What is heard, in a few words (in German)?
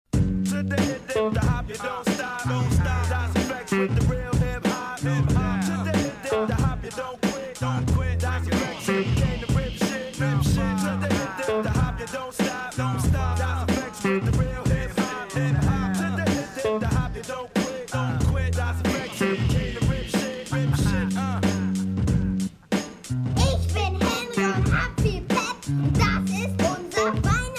Hip Hop; Hort; schulkinder